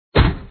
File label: hit Lamp; the independent